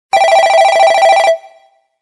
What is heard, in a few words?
Basic Ring; LG VX11000 enV Touch